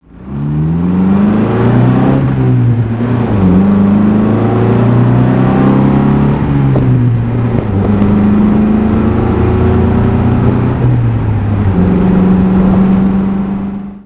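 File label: Healey 100, classic car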